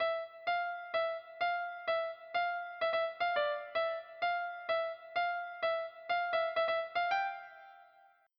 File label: BIG ROOM EDM DROP; MIDI